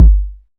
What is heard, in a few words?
small reverb kick G key; kick drum samples